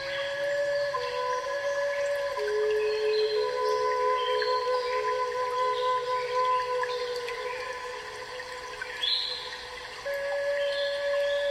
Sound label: flute; twigs tall man